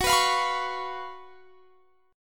Gb Major 7th Suspended 4th Gb, guitar

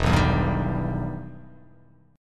E Minor Major 7th E down

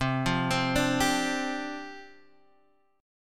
C Suspended 2nd Suspended 4th, down; Csus2sus4 arpeggiated

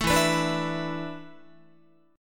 E 7th Suspended 4th, Guitar; E7sus4; strummed

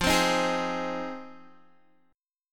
F Minor 7th Guitar; Fm7; strummed; 12,15,15,13,12,x; 4F,4A,5C,5G,5C,6E

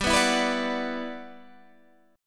E Minor 9th G

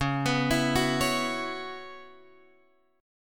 C sharp Minor 11th, Guitar Open F; C#m11; arpeggiated; JGuitar

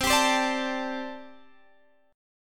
G Suspended 2nd Suspended 4th

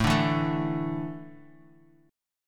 A Suspended 4th A, chord

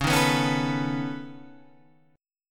C sharp 7th Suspended 2nd Sharp 5th C sharp down

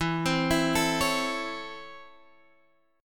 Db Minor Major 7th Sharp 5th E

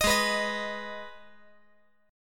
G sharp Minor G sharp down, chord

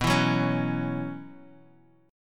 B Suspended 2nd Suspended 4th B, chord

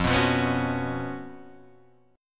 F sharp Major 7th Suspended 4th

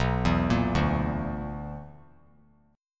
B-Minor Major 7th Double Flat 5th; BmM7bb5 arpeggiated; JGuitar

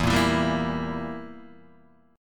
E Diminished down Guitar Open D

